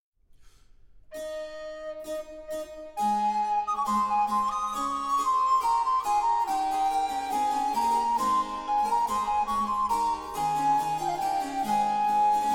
Sonata Terza

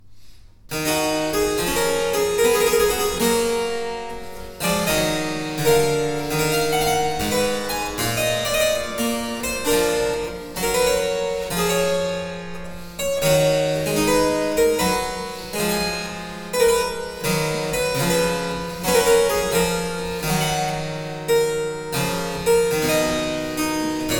Harpsichord, Sarabande; Handel; Suite 4

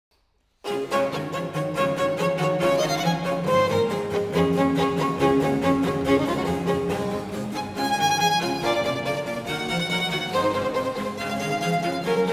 Concerto per violino in D Major Allegro

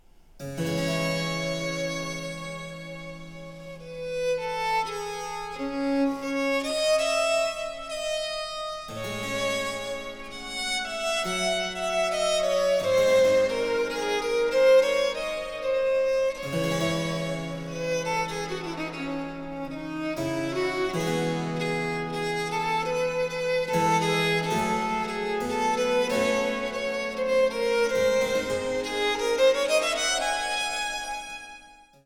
violin

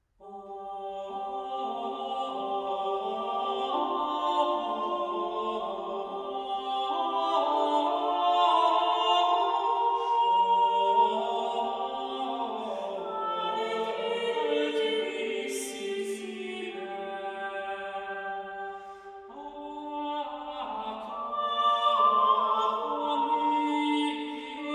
Flemish Polyphony; Tourout; Isaac; Weerbeke

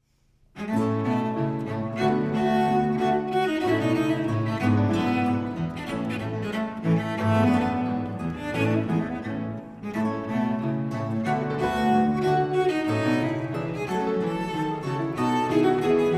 Cello Sonata in B flat Major Allegro

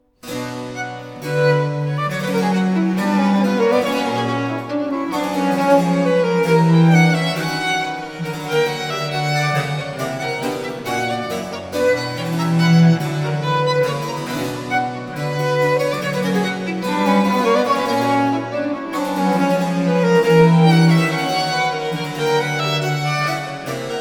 Canon a 2 Violini; Spiegelungen; J.S.Bach; Musikalisches Opfer; in unisono

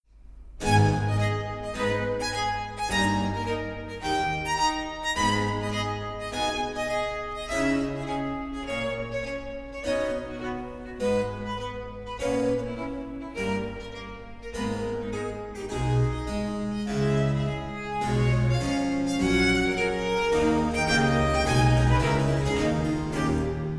Flute Concerto in A Minor Grave; HWV 287

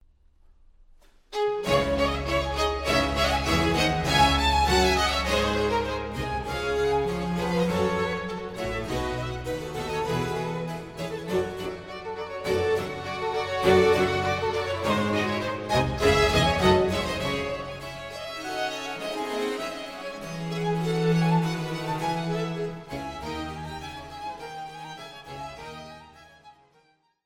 Divertimento in D minor Allegro; Avondano

